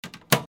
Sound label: mechanical switch